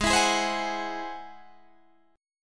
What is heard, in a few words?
Ab Augmented 7th Ab Guitar Standard; strummed; 16,19,18,17,19,16; Ab+7; JGuitar